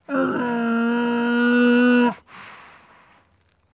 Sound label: Camel Mule Moan; camels